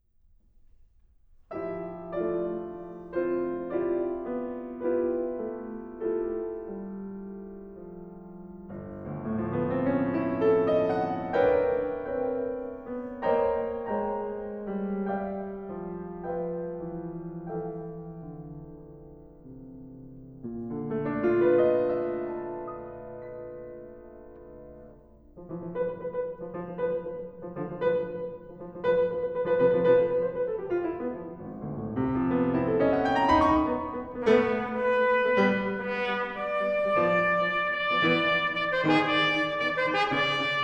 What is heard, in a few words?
Trumpet and Piano; Sonata for Trumpet and Piano